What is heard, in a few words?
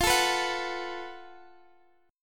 F sharp Minor Major 7th Double Flat 5th F